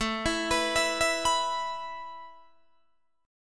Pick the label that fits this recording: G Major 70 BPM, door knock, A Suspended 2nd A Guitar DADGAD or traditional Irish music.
A Suspended 2nd A Guitar DADGAD